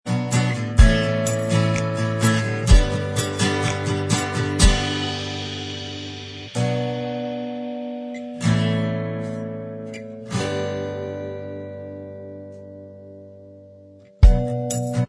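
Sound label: acoustic style instrumental, backing track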